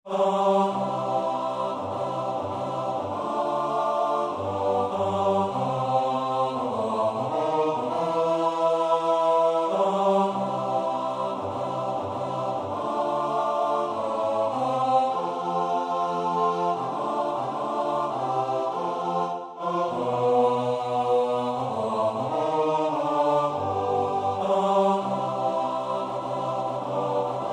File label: Jünglingswonne (T-T-B-B), Männerstimmen; Schubert (Franz); Vier Gesänge für vier Männerstimmen, Op. 17; 1.Jünglingswonne, Op. 17, No. 1